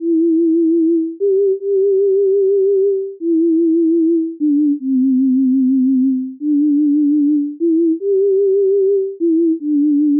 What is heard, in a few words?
vibrato-1(抖音1), Cmusic